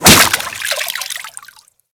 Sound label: Whip Impact Water, Weapons